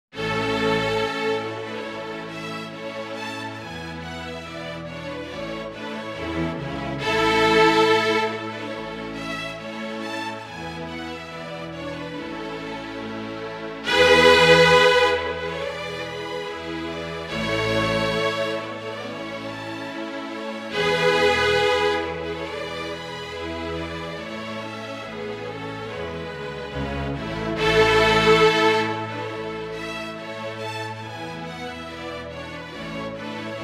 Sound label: strings, pantomime ballet; Le déserteur; Dauberval (1784)